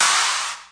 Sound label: static clap; Claps